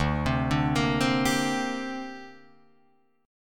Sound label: D 7th Suspended 4th Sharp 5th down Guitar Drop D